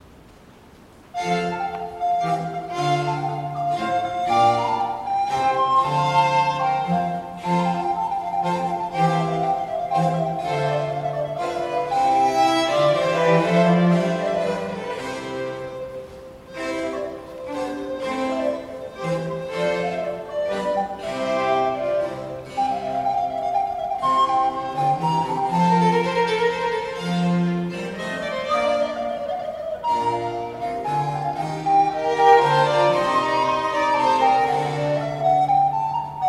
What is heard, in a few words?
Sonata in F Major for Recorder; SCARLATTI; Spirituoso